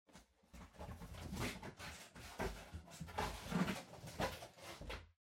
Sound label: Cardboard Box Far Fold; ダンボール01-14(遠い 畳む); 効果音＞梱包材